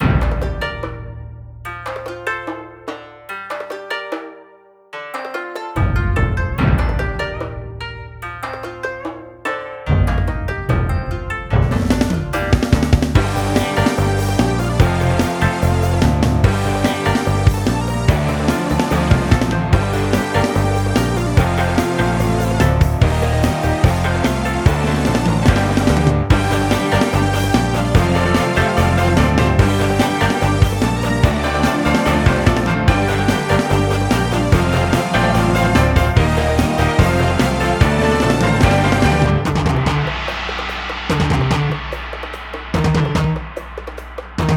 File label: Eastern Temple; Epic Dumpster Bear; Wii U